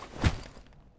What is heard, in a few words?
Drop Sack; Civ4 warlords